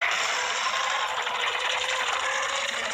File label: Mega Kaiju roar